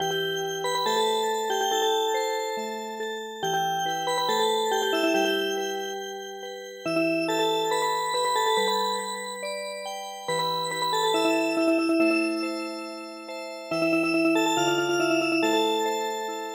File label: Music Box, traditional; アイヌ民謡の「雪かき歌」に癒し系のアレンジを施したオルゴール曲を聞くことができます。「雪かき歌」は、スローテンポな4拍子の日本の伝統曲です。; ＰＣ ＭＵＳＩＣＢＯＸ